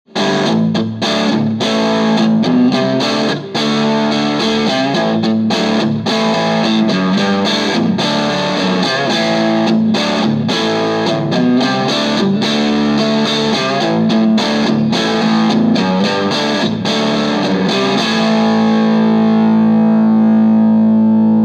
Crush Crunch

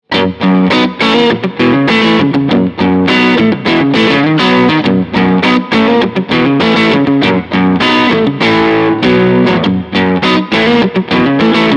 Super Crush 100 GC Blues

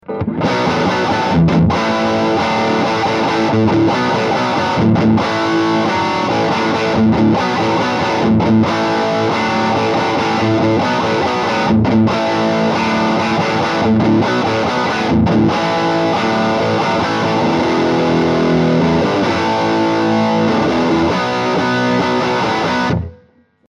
Rocker 15 Dirty; Orange

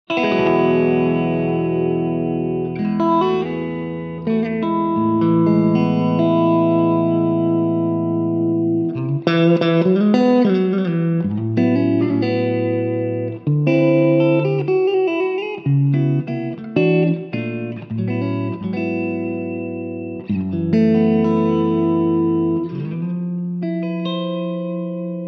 Crush Clean Mellow Reverb

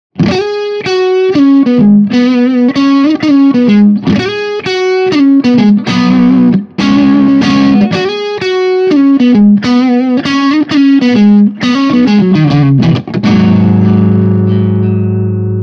Bluesy Lead; pedal; previo de guitarra y eq; Bax Bangeetar

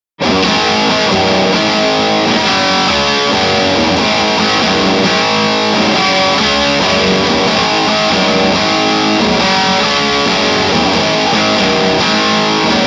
Distortion Full Gain clean amp Vintage Pedal

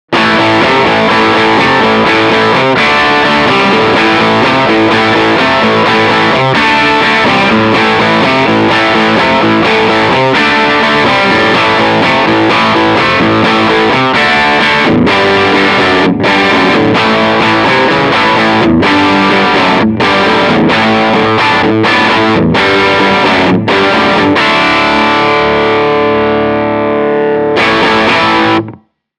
Super Crush Classic Rock

